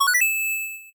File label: 8bit se